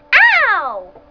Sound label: "Owww", human